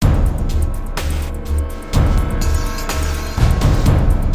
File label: Medium Music; Alien Breed Trilogy; PS3; Xbox 360